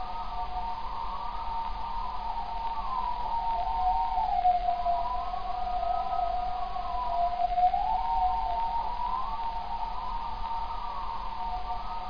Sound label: moan; Quake; PainKeep